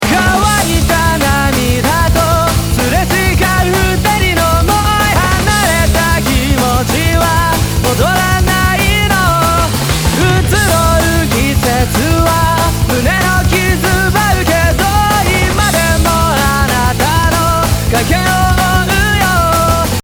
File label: vocal; hamori nashi